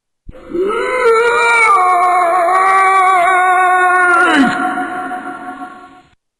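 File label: zombie message, sms; ringtones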